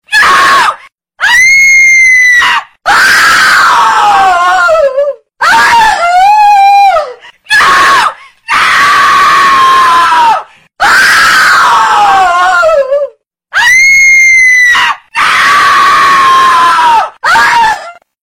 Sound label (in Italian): Suoneria urlo Spaventoso